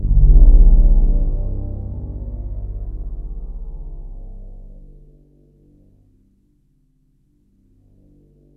JV-1080

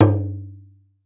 E, talking drum; Best Service ProSamples vol.55 - Retro Sampler; AKAI

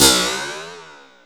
HH CLOSED, Disco House

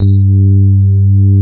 RHODES; Pro-Rec - Synthsonic

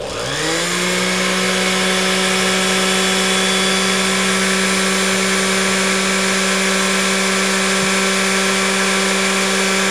BLENDER; RockRip Blender; SFX

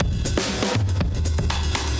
Beat Style, 120bpm, breaks

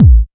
KICK, BASSDRUM, Techno 138 BPM; Best Service ProSamples vol.54; AKAI

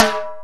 DR550 Timbale, Boss DR-550 Drum Machines; 300 Drum Machines

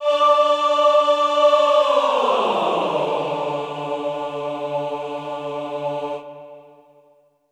GLIS D3-D4, Classical Choir; Best Service; Extended Classical Choir; GLISSANDI

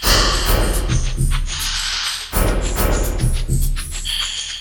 PLAS LP, Industrial Loops; USB Soundscan vol.24; AKAI

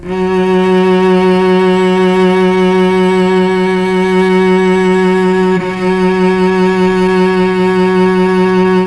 F#2 CELLO, Mellotron; CHMBLN CELLO; Mike Pinder - Mellotron Archive CD

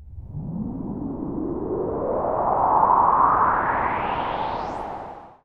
Virus Noise

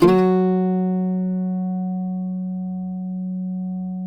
CUATRO F# 3, Guitars; Spectrasonics; Hans Zimmer Guitars VOL-2

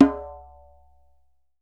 DJEMBE; E-MU Producer Series Vol. 7 – Old World Instruments; Drums&Percussion

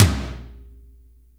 TOM, Drum Dry; East Collexion; Drum 1

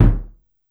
C2 Gran Cassa Mute, Yamaha PSR-E323 Symphony Kit

